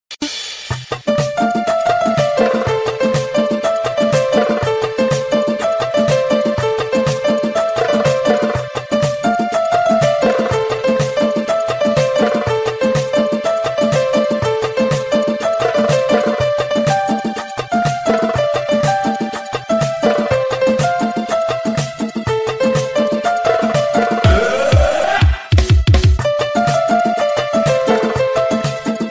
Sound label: Mobile Ringtone